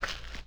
TIRE STEP; Cars; XBOX

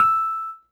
school piano e; Tony Hawk's Project 8; XBOX

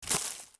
FS Weeds, footsteps

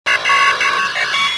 console, ambience; kejim

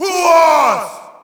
Shout, audience; UFC Tapout 2

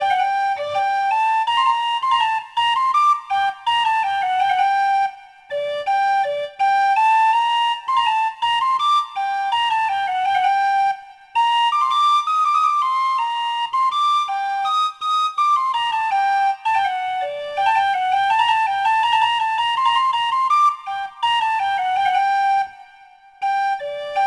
township wright; Sid Meiers Pirates; XBOX

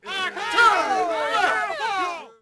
CROWD NEG LOW; Cars; XBOX

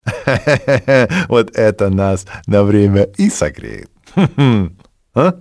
drink, guard; Soldier Of Fortune II Double Helix; XBOX

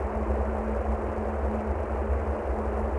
ambient; draco

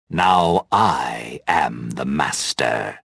victory; reborn

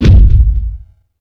MOUNT HIT; UFC Tapout

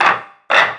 rack; Virtual Pool Tournament Edition

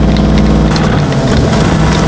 sam aim, weapons; Soldier Of Fortune II Double Helix; XBOX

